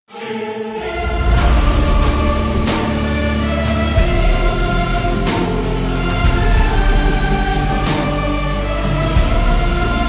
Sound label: orchestral; Pubg